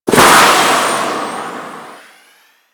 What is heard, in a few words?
Arch Wing Movement Forward Transition, Vehicles; Warframe